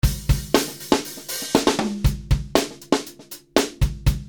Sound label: dry b 120; Drumloops